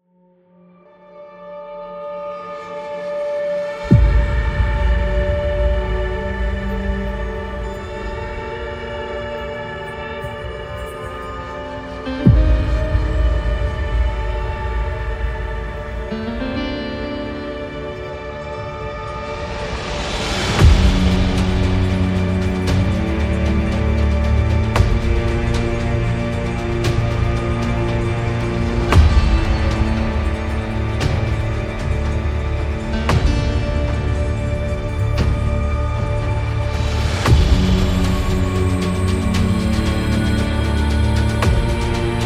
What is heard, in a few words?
Oracle Desert, cinematic